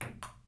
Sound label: PRC PP, Ping Pong; Roland L-CD701; FX Perc 1